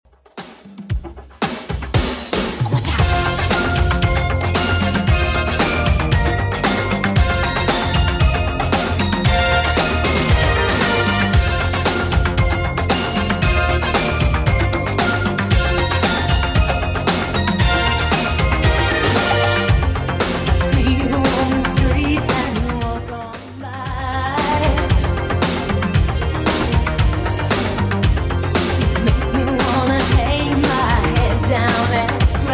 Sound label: 80s Redrum 115bpm